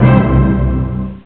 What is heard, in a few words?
Orchestra Hit; Beyond HyperCard